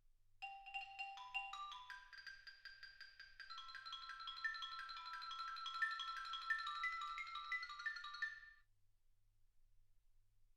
xylophone

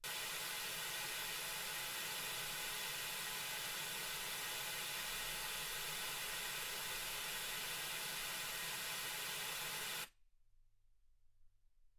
wgn 48kHz

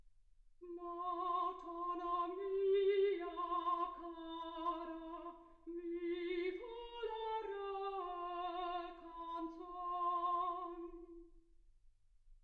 soprano

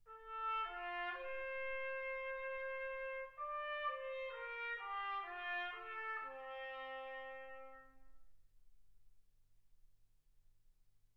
trumpet

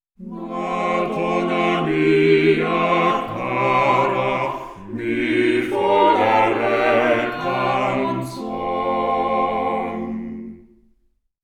quartet

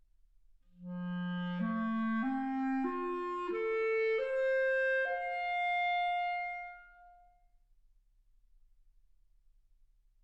clarinet

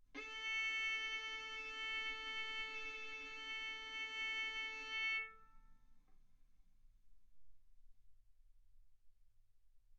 Violin arco mf sul A A4 B4 stereo

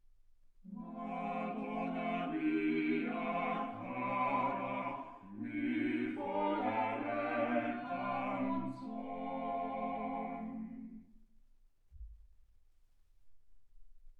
quartet

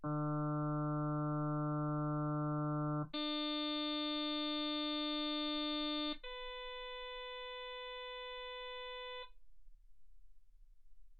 harm sinus 48kHz

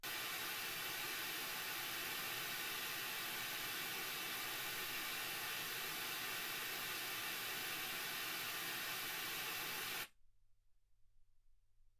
wgn 48kHz

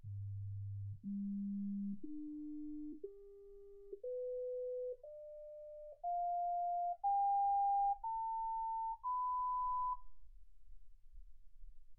sinus tones 48kHz

